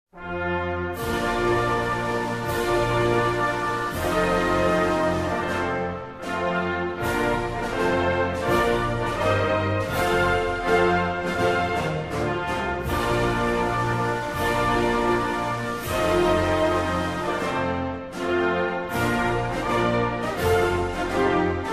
Instrumental; My Suomen beloved (Official Instrumental)

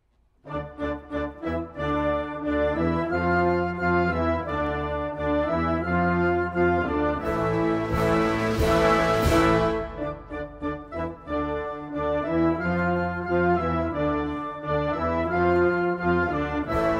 National Anthem; Latverian

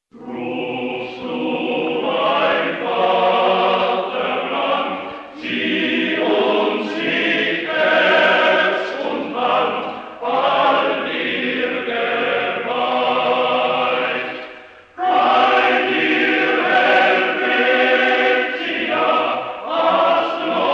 Swiss national anthem; Rufst du mein Vaterland

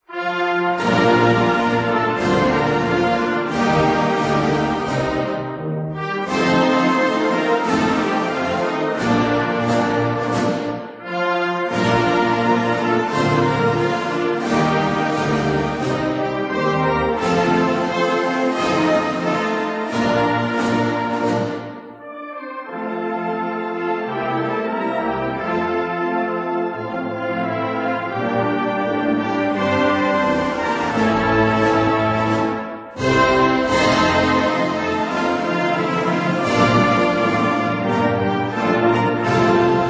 Anthem; Brandon; Internationle Short

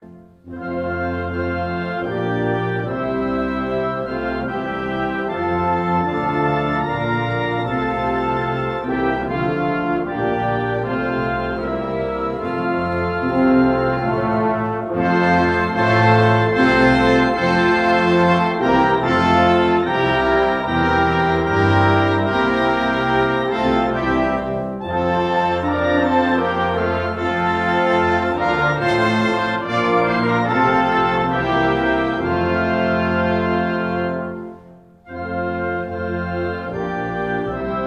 instrumental; God Save the Empress

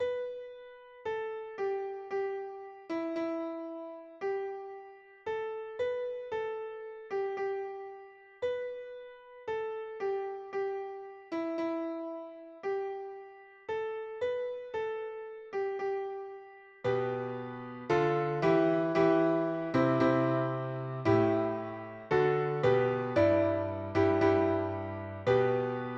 Piano composition; This, We Are Proud

